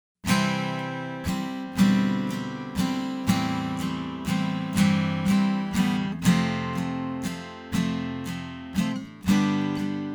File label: visions unplugged